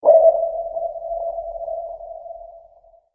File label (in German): echolot; klingeltone